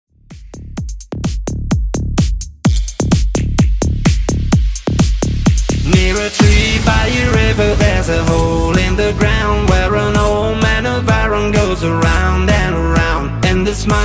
Electronic House 128Bpm

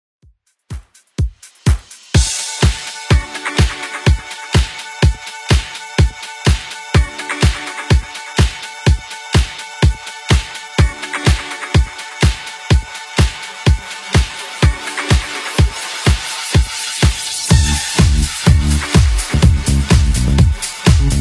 2Ks Redrum 125bpm

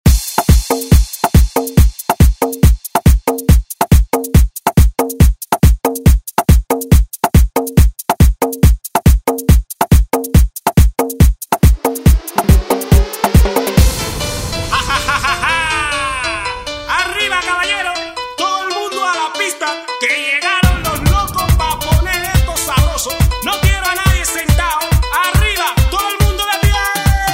ReDrum; DJ Mhark

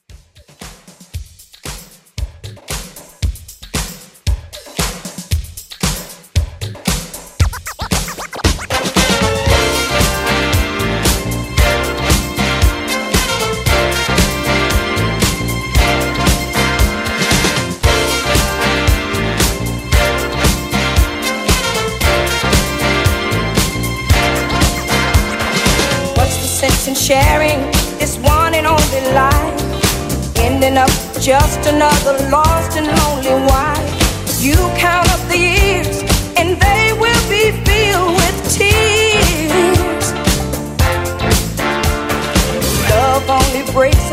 70s Pop Redrum 115 BPM

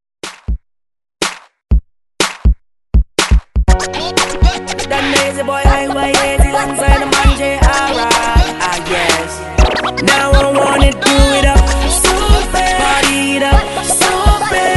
Hype Re Drum 122Bpm

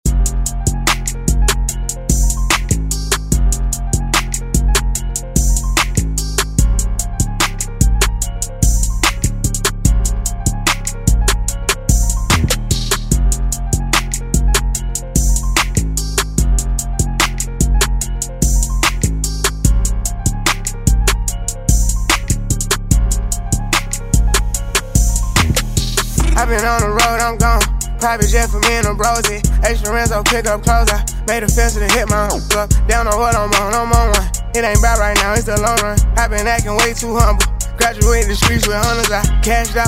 Hiphop Redrum Intro

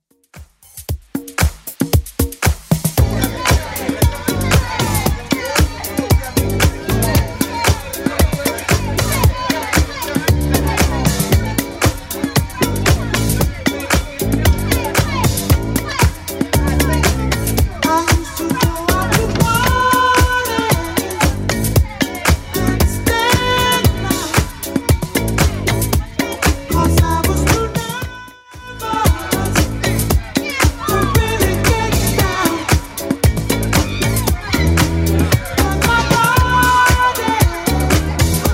70s Club Edit 115bpm

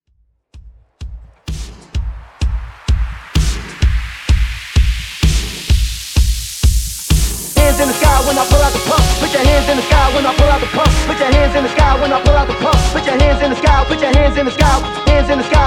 Extended Redrum Hype

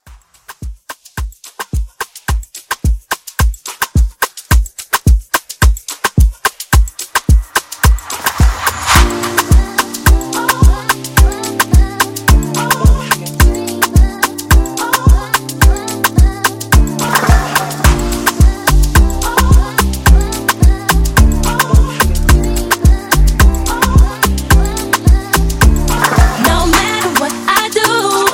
Moomba Intro

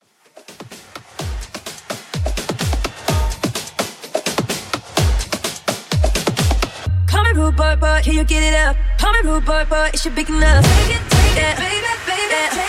Tech House Intro; Remixfreakz

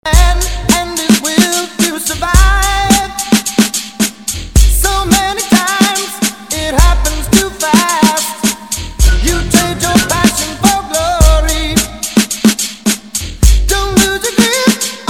Classic Hip Hop Rock MashUp

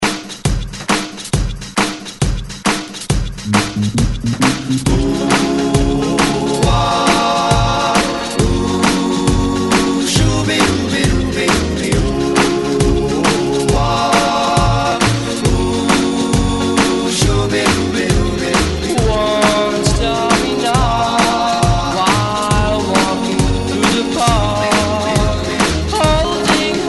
Re Drum 134 Bpm; DJDOLLS

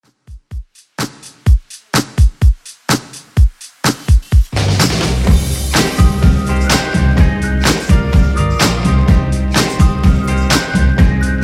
90s Redrum 126bpm

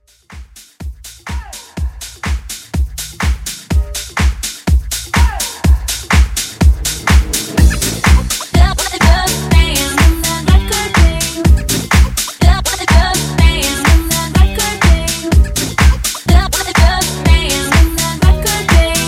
House Hype Re Drum 124

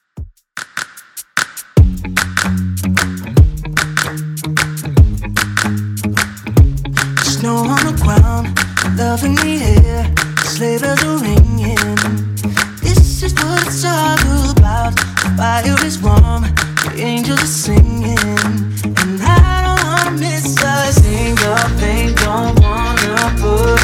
Christmas Simple Redrum 75bpm

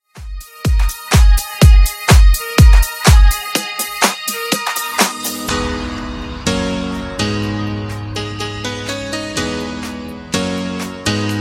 funk, 124 bpm

